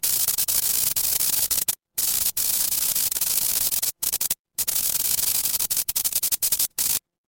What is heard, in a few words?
fire electric spark